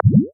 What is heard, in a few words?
interaction tap; sfx